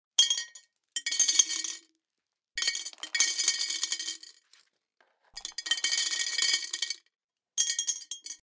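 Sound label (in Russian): звук гальки падающей в стеклянную бутылку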